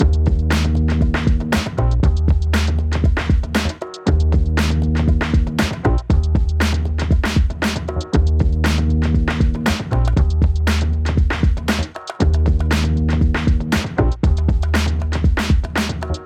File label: 90 hiphop 118bpm; hot lava; ios macos windows